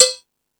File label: Percussion, Drum Machines E-MU Pro-Cussion